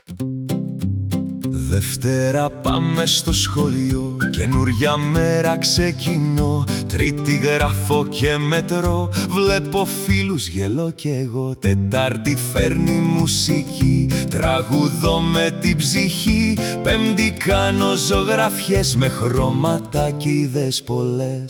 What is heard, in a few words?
the days of the week campfire acoustic